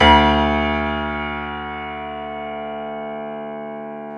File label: PNO Grand Pno; Club-50 - Foundations Roland